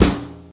puffy snare sound a sharp key, steel snare drum tuned to the A# note